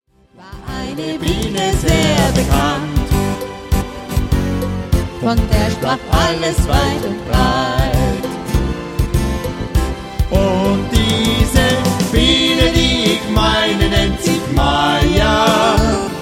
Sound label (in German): Duett